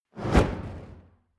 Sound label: fire torch whoosh fast hard; swooshes-swishes